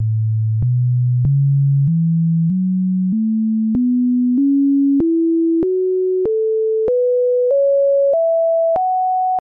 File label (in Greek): pentatono